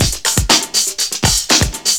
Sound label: LOOP; SoundFactory; DRUMLOOP